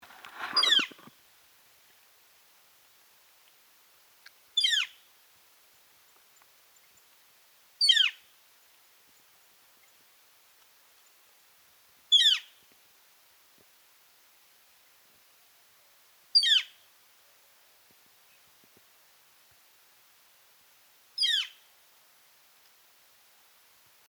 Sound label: Gilded Flicker, Colaptes chrysoides; woodpeckers